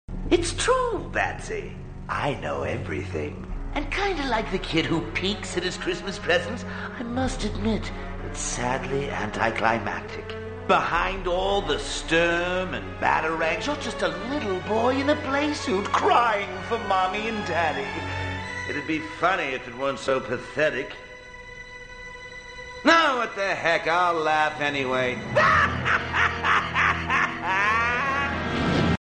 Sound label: laugh anyway; rotj